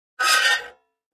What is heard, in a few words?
Metal Scrape On Metal